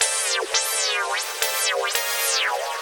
Fuzz Jen A 170 A, modular 170bpm; modular madness

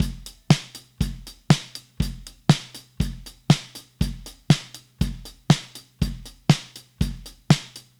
Drums, 70s Analog Funk; Sonic Foundry (Sony Creative Software); What it Is!; Classic Caddy

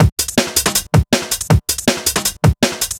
Timbal Beat, Drum N Bass; Sonic Foundry (Sony Creative Software); Knutrix Drum & Bass

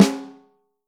Vintage Snare Drum, 6.5X15; Q Up Arts; Johnny.C's Vintage Snare Drum; 6.5X15 LUD

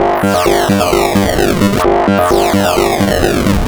MiniBrute Loop, 130BPM

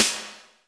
ECHO S, DrumBase, KITS; Crypton - DrumBase5000; BABY KITS

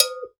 GANKOKWE, Gankokwe Bells, Percussion; Drums&Percussion; E-MU Producer Series Vol. 7 – Old World Instruments

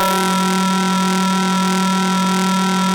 700 MOD3 F#1, Korg; Back In Time Records; Korg Universe VOL-1

